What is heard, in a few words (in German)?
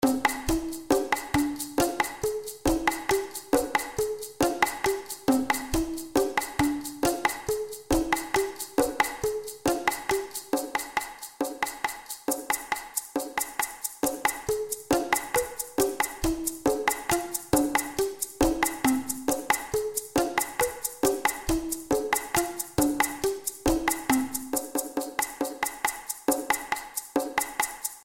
Boomwhackers klaenge